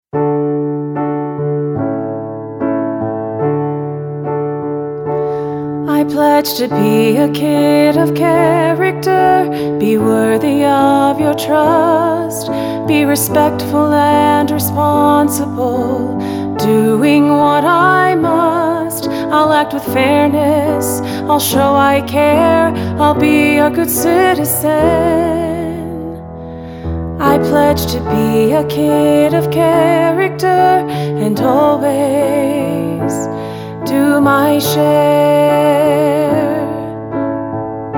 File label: Lead Vocal Track